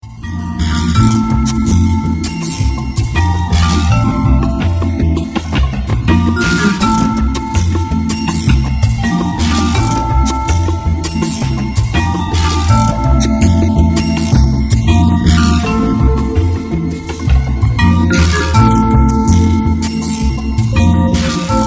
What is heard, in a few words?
jazzy oh